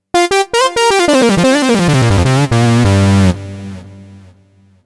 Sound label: Yamaha Solo